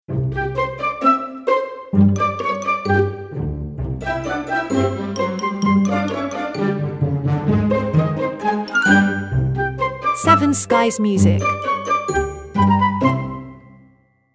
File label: Piggy Back Ride